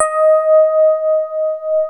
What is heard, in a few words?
DX ROADZ SFT, FM Paired Tines; E-MU Producer Series Vol. 2 – More Studio Essentials; Keyboards